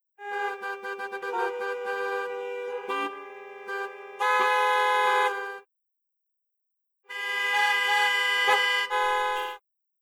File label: Tokyo Cars